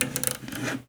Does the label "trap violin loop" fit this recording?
No